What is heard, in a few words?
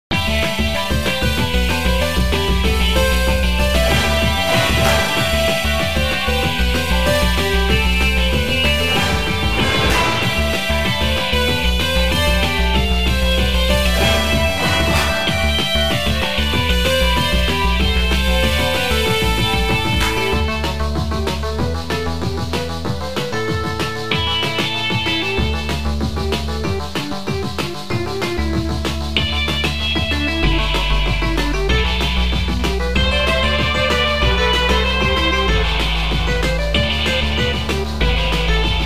boss battle